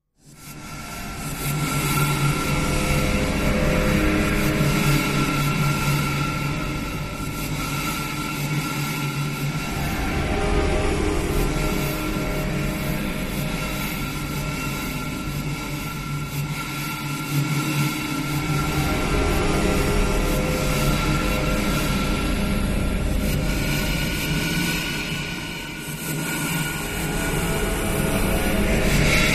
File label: Alley Way Subway Amb, eerie ambience